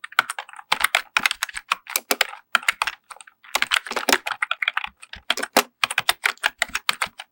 keyboard console

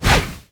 vine stun

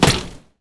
wand

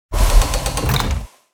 bat mech open, ambience

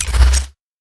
laser gun reload

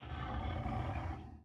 sleep breathe

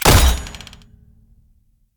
nailgun

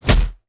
punch

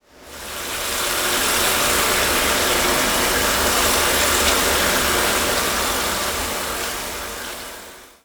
car wash

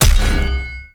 snake knife

